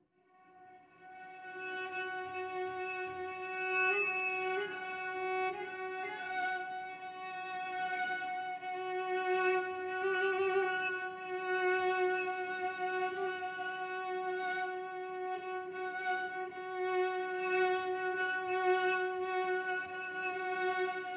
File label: solo cello